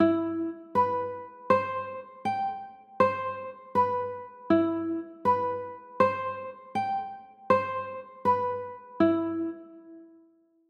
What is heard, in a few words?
c maj7